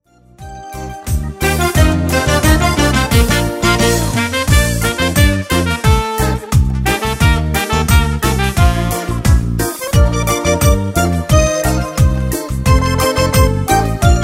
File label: kalimba